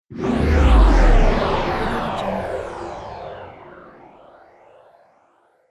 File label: huge scifi flyby